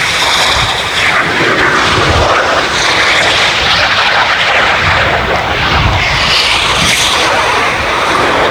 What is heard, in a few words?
m202a1 rocket, weapons